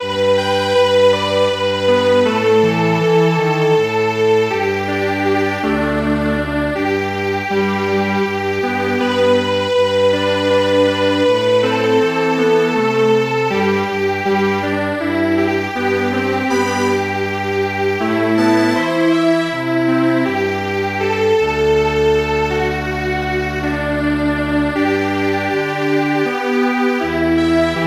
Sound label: Strings Quartet; My dear mothers child